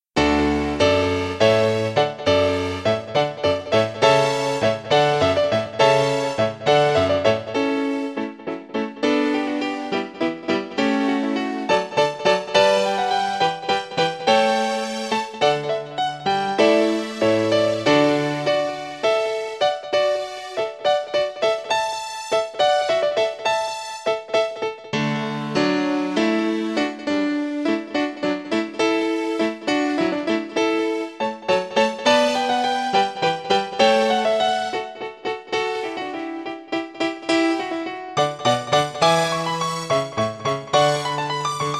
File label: Hornpipe